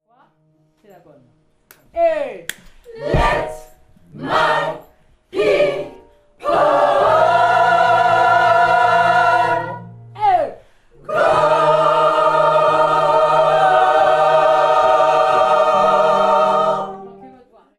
fin tous, gospel

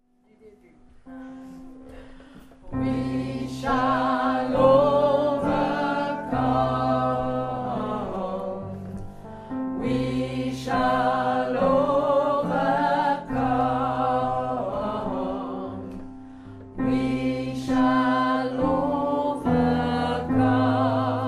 alto homme; Couplets; gospel

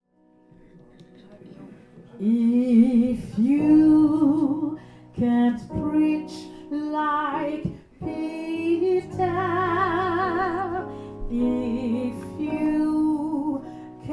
couplet, gospel; couplet 2

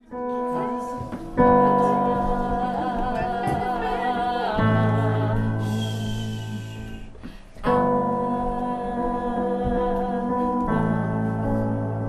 pont nappe alto; gospel

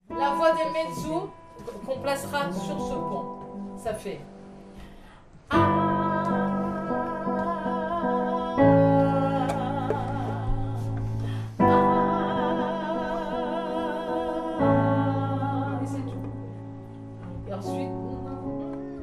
pont nappe mezzo, gospel

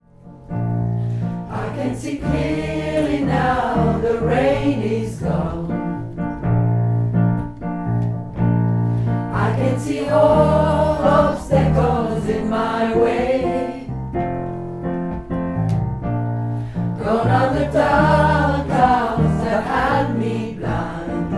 couplets alto homme; couplet 1; gospel

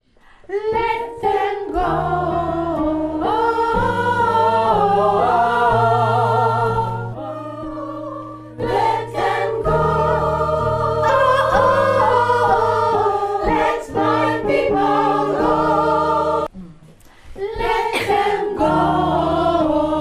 partie mezzo; gospel

